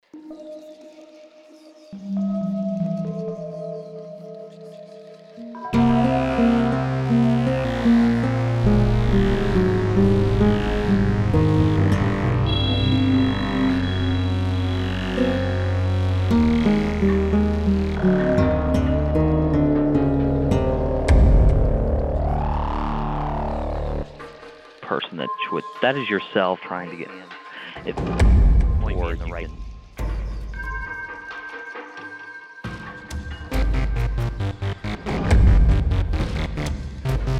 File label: Wind and Thunder; oil drums, percussion, synthesizer, acoustic guitar